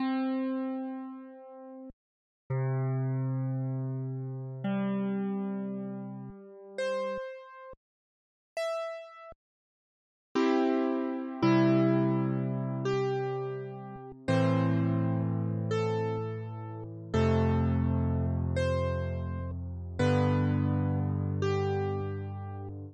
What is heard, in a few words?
piano brightest; voxel audio preview